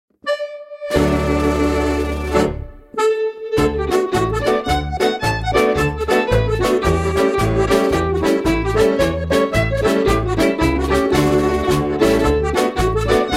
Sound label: Jig